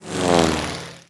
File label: prop plane passby